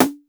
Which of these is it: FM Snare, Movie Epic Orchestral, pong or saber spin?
FM Snare